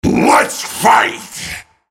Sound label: spell cast effort, en US; Rengar